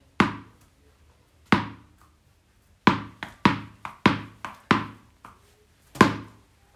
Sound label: Bouncing A Tennis Ball